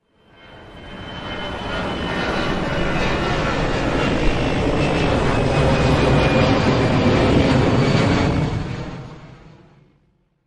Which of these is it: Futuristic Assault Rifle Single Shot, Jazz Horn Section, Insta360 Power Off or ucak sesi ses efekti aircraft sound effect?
ucak sesi ses efekti aircraft sound effect